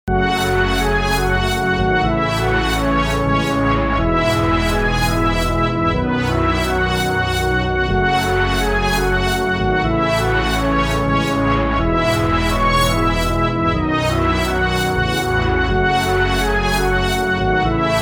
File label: OB Horns Bass n Brass Dry